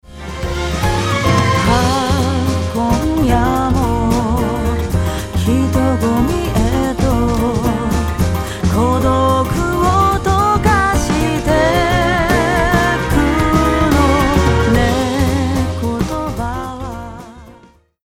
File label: Mix Vo Vol Off